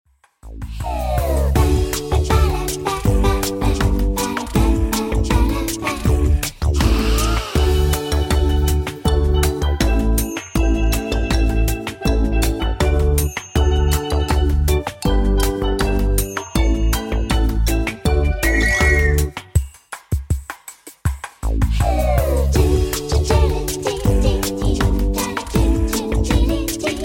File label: Karaoke